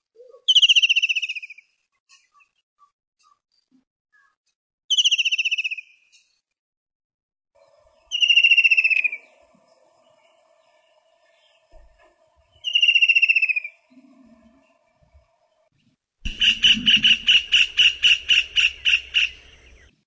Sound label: white throated kingfisher, birds